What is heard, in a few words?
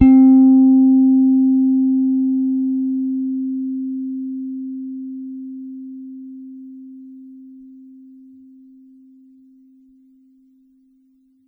Gs C5 soft, Bass, Pedulla Thunderbass; Giga Samples Collection